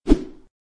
hammer swing

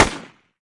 ak47 shot

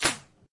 crossbow shot

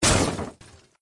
metal destroy

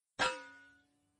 metal impact

